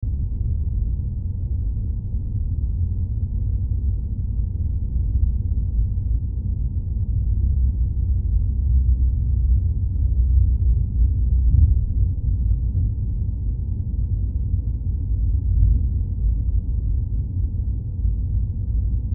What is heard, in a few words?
HUMREC, Brummton